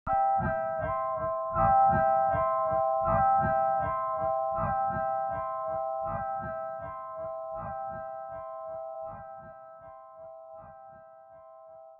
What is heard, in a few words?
ambient